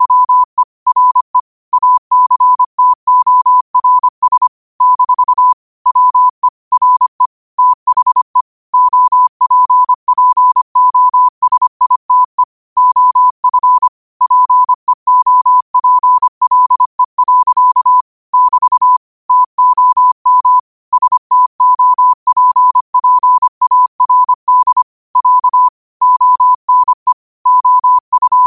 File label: 5 wpm, morse